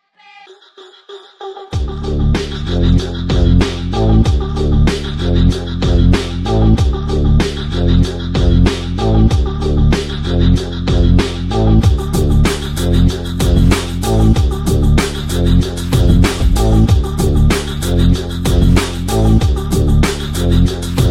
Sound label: Sinewave